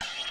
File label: breakbeat